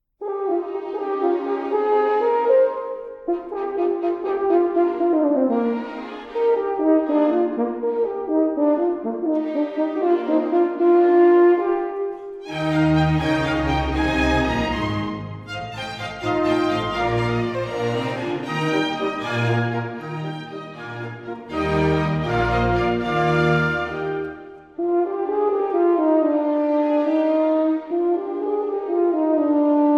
Concerto for Horn and Orchestra in E flat Major, Rondo; Allegretto